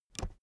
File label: keyboard external space push